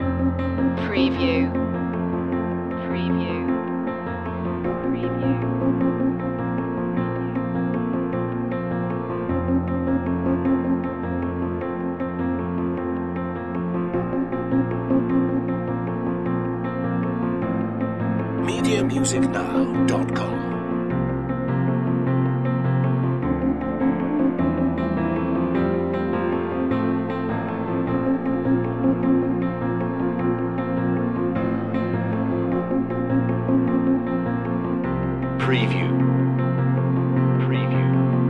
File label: sailing to the end loop